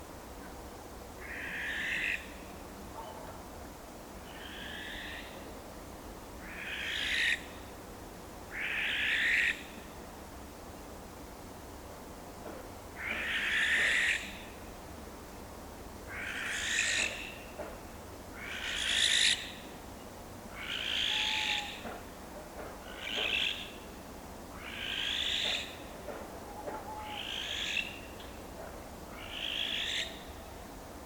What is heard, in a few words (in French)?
Effraie des clochers Tyto alba